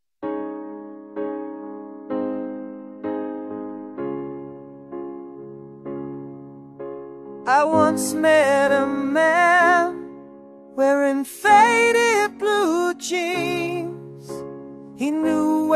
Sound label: piano mix